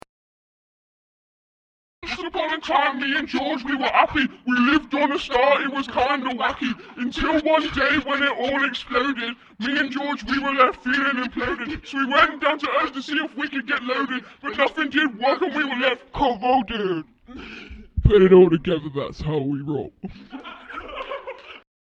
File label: rap